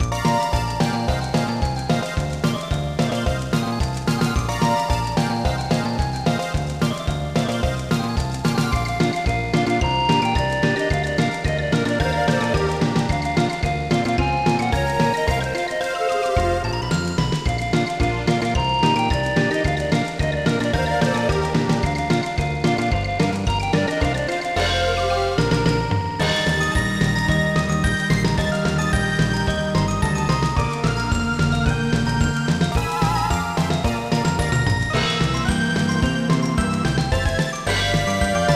In-Game Music

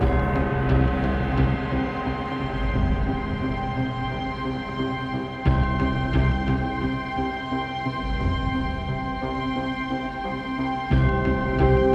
Winter Ambient Night Loop

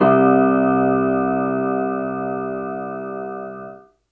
Piano Walkway Standard C1; super crazy rhythm castle

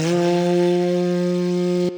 Mus Human Tsunami 120; from dust

